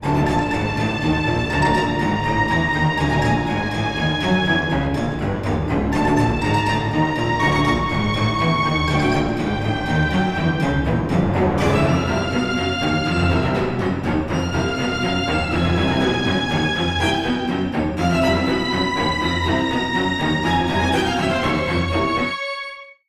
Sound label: String; The Bureau XCOM Declassified